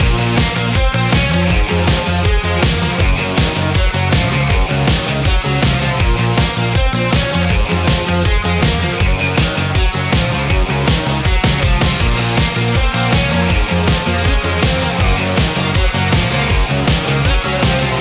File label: My Guitar Likes To Club